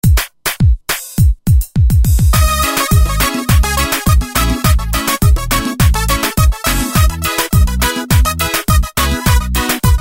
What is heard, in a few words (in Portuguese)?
kizomba ritmo G70; roland g70